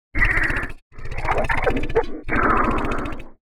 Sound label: Vocals